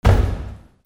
FOOTFALL

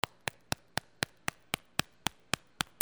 FIRE

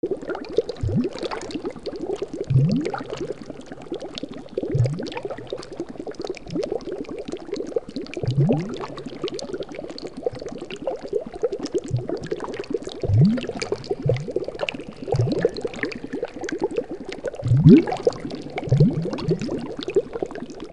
WATER

